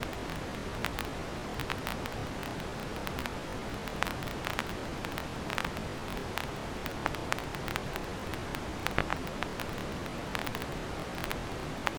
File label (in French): Craquements Vinyl